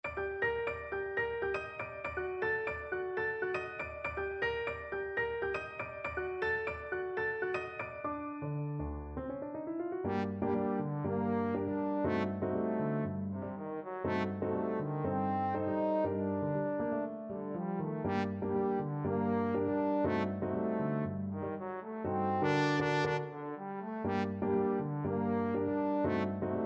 trombone

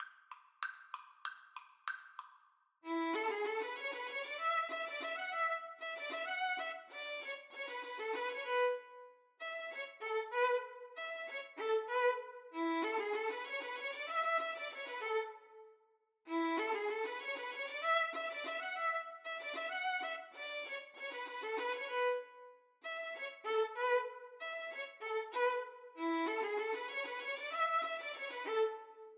zwiefacher violin duet

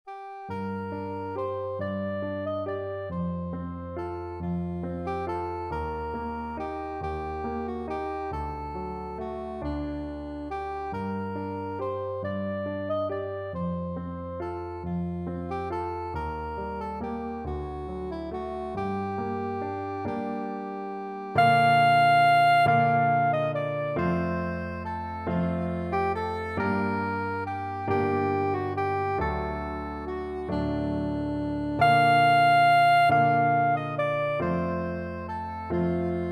greensleeves, soprano saxophone

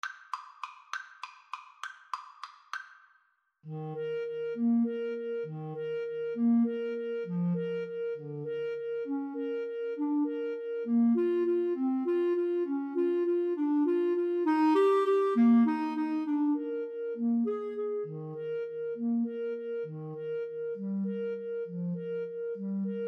waltz, Flute-Clarinet Duet

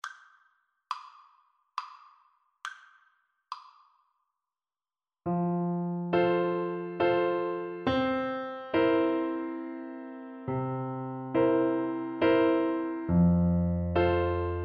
happy birthday, bassoon

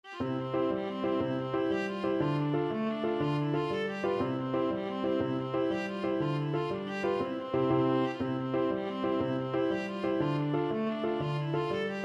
irish jig medley

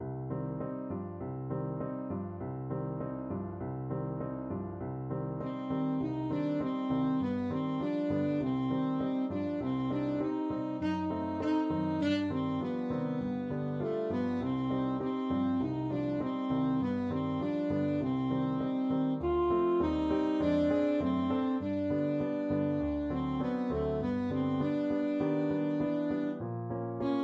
ASAX, saxophone

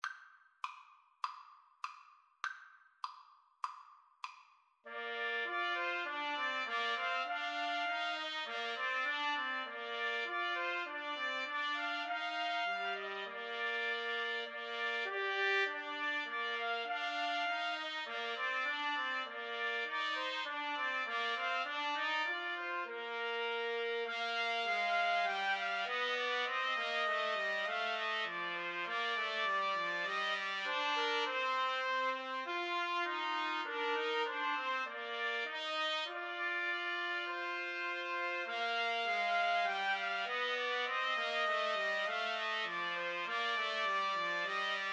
3TPT, Trumpet Trio

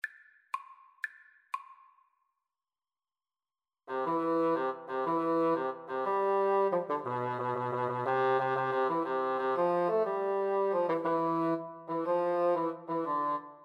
2 bassoon; duet; mendelssohn italian

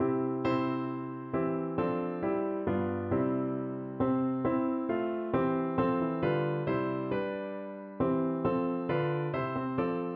piano

